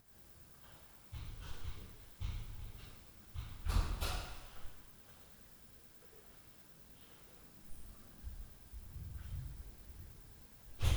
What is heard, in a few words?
DOOR NOISE, Night Noise